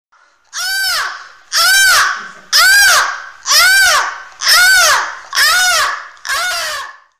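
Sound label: mops dada pes cajka na sms, pug dog barking, sms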